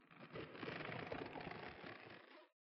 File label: arm raise; Mommy LongLegs